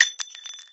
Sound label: casing; silverbullet